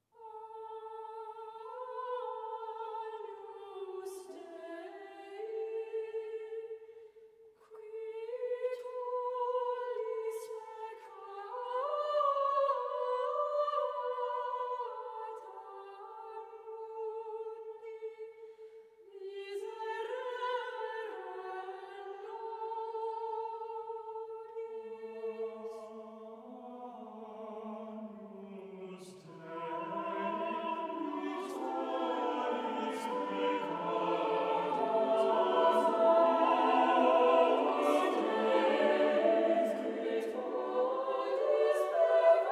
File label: Choir; Imogen Holst; Choral Works; Mass in A Minor